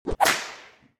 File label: Whip Crack